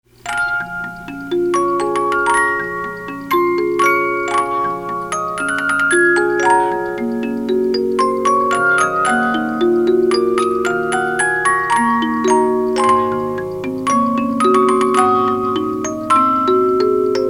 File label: Meditation, 50 Note music box